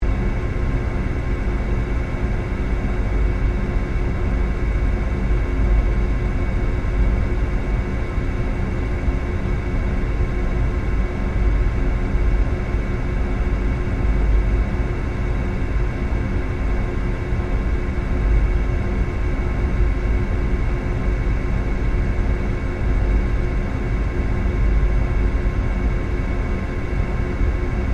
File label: Engineering Room, Industry, Industrial, Engineering, Room, Ambience, Loop